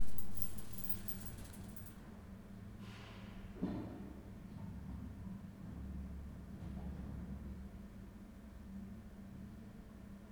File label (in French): ascenceur; Ville; ZoomH2N